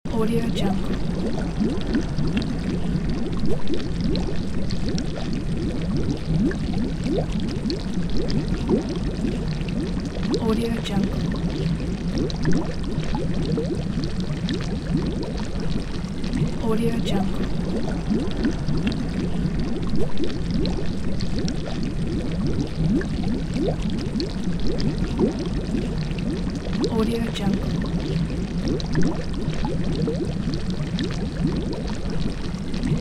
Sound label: laboratory bubbles